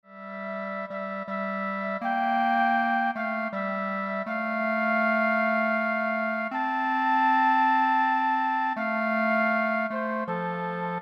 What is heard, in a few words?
Flute Clarinet